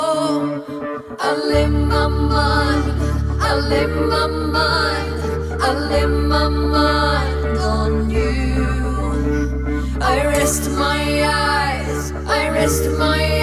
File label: Oriental